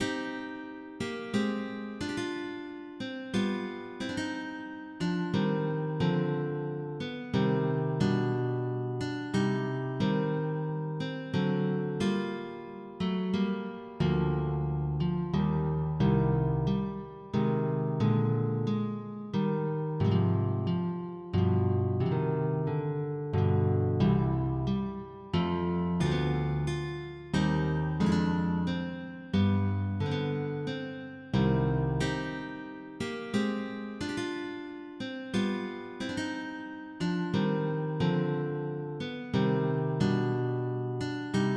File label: Prélude, MIDI